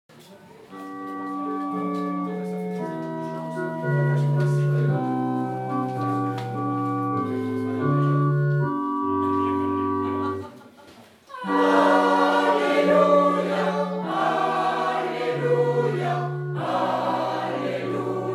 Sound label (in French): Alleluia de St Augustin, chorale